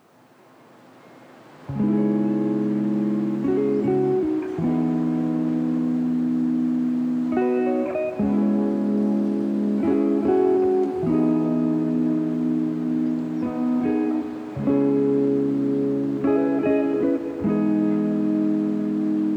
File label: lofi hip hop